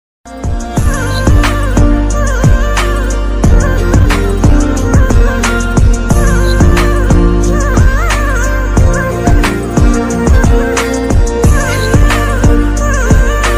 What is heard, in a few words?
music ringtone nepali song ringtone flute ringtone